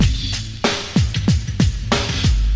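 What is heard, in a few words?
subtle bassline, Boombap Drum loops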